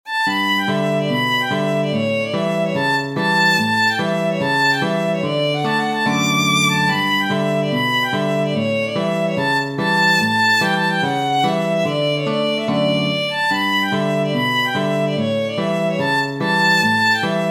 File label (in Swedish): Schottis; Petter Lejons